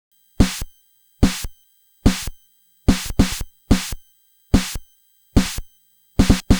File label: NES Drum Loop; Nintendo Entertainment System; Drums; Chiptune; Sonic Foundry (Sony Creative Software)